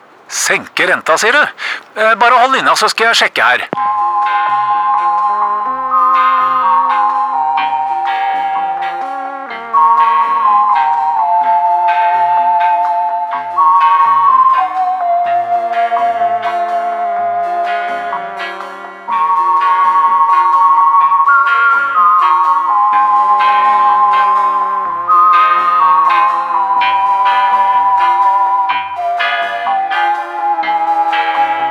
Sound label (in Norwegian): Ventemusikk